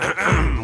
ahem male; ooh ahh; human

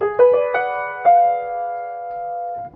piano, sad loop; Loops

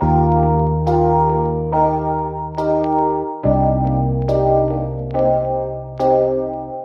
keys, Sad Loops

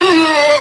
huhuh, voice; ooh ahh; heh huh

